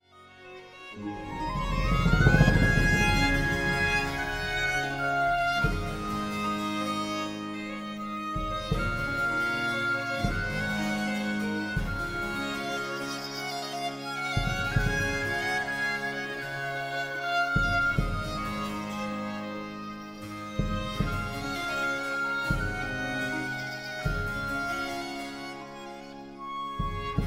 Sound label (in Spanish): Magestuoso Bayle A violin y Bajo; CÓDICE MARTÍNEZ COMPAÑÓN Bayle Del Chimo; festejo peruano